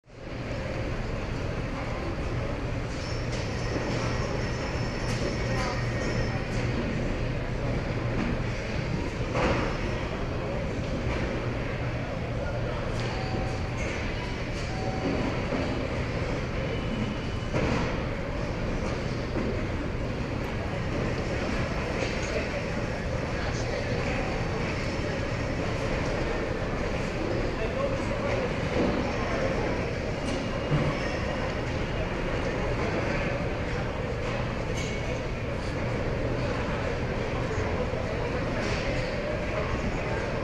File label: airport security, ambience sounds